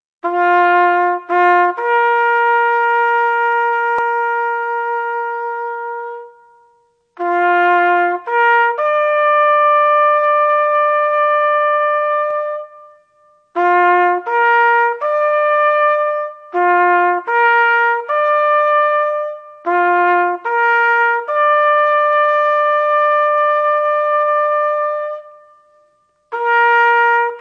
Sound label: TAPS